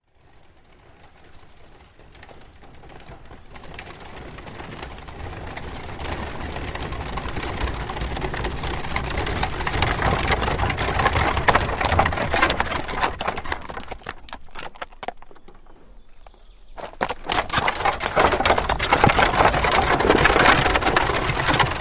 Horse Drawn Carriage Up Stop; Horses Carriages and Traps; Hollywood Edge Historical Series

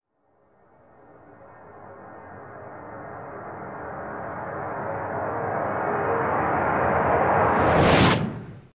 Reverse Stinger - Tonal Synth Whoosh; Hollywood Edge Sound Designers Tool Kit

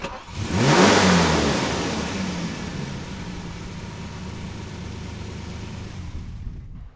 engine start

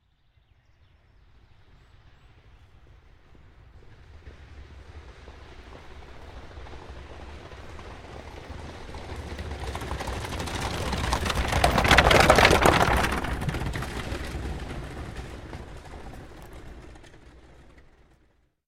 Horse Drawn Carriage By Fast; Horses Carriages and Traps; Hollywood Edge Historical Series

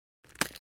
Bone Break; Fights Bodyfalls

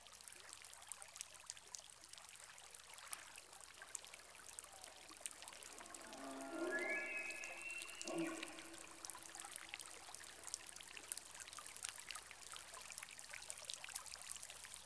elk in rainforest

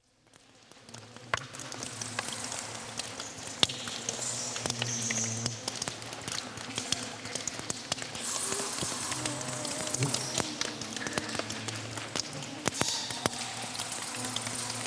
Walking In a Cave; Dr. Sound Effects; 210 Nature Sounds - 20 Hours of Relaxing Natural Ambiences for Meditation and Sleep

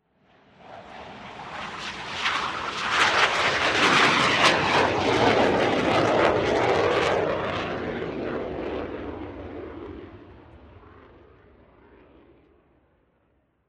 Fly by; Blue Angel

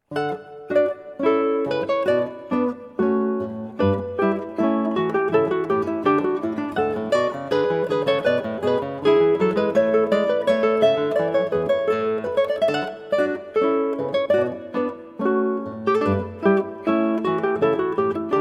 Gavotte from French Suite No.5, 8 string guitar; BWV 816; JS Bach

